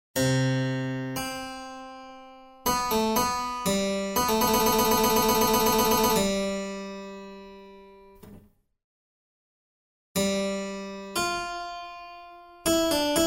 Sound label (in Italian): Tema e risposta